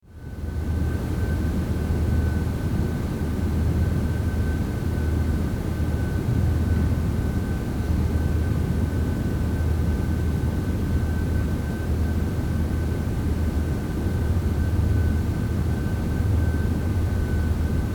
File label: Room Tone